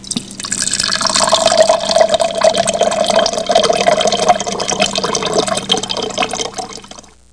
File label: water